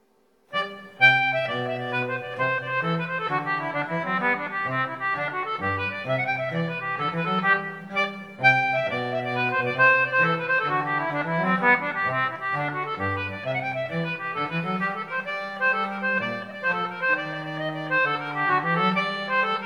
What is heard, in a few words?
concertina; Gabriel's Leap; English concertina